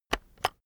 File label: keyboard external enter press slow heavy